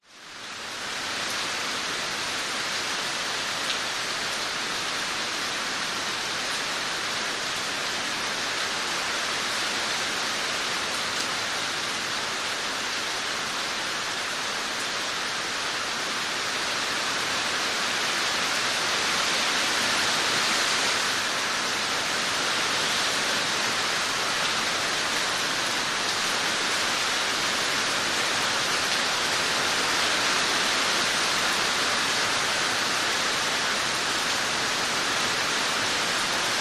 nature rain heavy storm roof ext drips

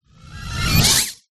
science fiction robot burst

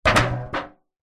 impacts metal thin foil baking tray hit drop; zapsplat

